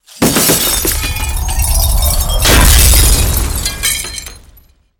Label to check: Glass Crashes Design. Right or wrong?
Right